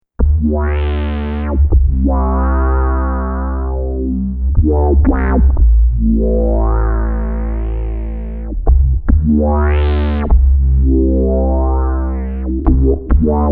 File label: v bass synth bass